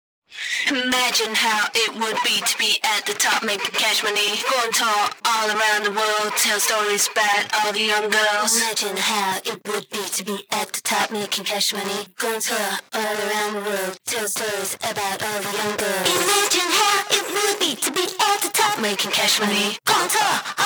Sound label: girls acapella